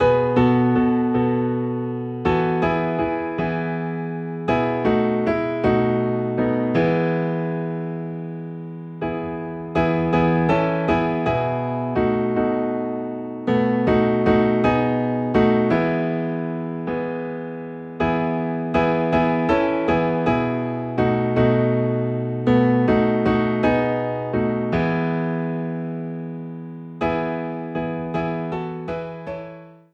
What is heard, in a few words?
Piano in E